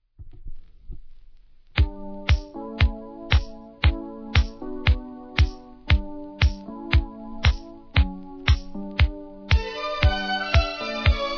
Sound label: holiday rap